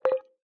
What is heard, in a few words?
Ui Sound